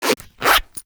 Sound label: zipper short